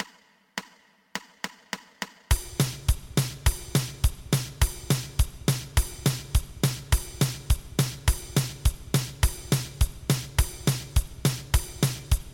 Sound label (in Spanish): 2 pulsos